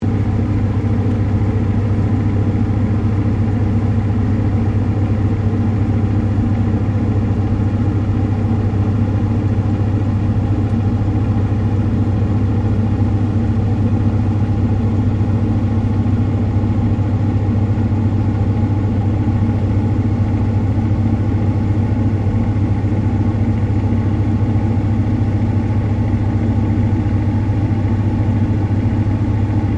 Water Purifier Industrial Operating, Industrial water filter system; Machinery; Machines